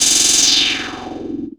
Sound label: filter roll; Zero-G; Total Drum Bass; Hits and Bits